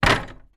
household gate wooden close

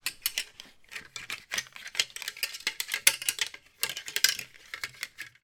coathangers x2 rattle together

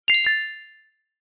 multimedia game sound gem treasure found

